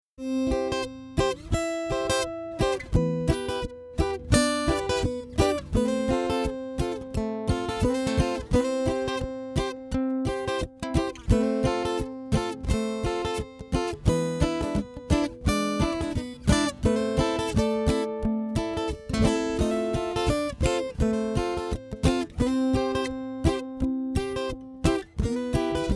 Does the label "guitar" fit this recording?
Yes